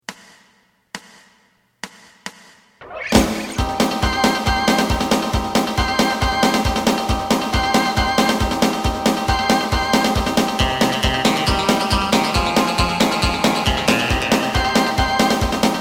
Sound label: bass